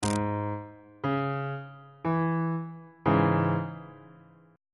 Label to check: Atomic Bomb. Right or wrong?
Wrong